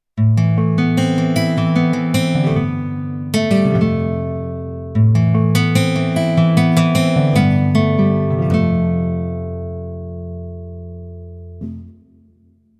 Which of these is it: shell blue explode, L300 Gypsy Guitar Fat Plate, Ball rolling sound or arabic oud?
L300 Gypsy Guitar Fat Plate